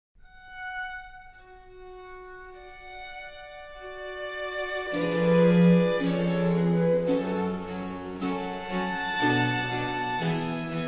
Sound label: Concerto in G minor for violin strings & bc; Antonio Vivaldi